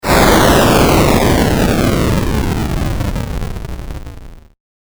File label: bomb